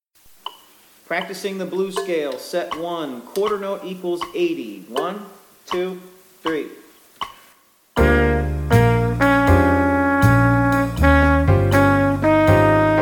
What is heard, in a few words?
Play along Blues Scale (with rhythm, qn=80); C Blues Scale, Set 1; with rhythm section; Chwalik